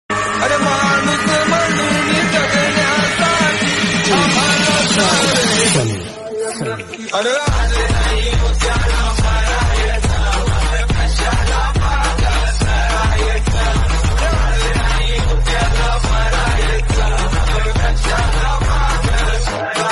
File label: bgm, marathi, attitude; veer martha; instagram